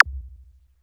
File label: SNAPPY, DRUMS; Music Store Sound Service; Einstein